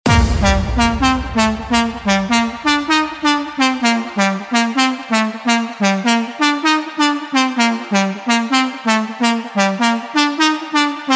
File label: Dance Bootleg; Deejay Hit